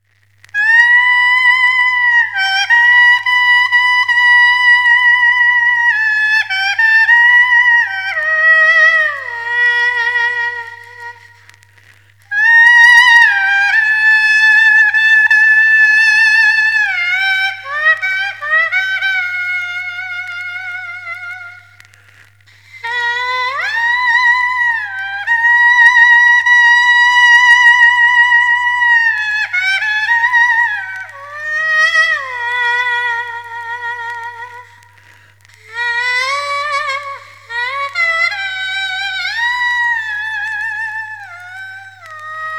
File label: Saxophon; Meyer 6M; Mundstückübungen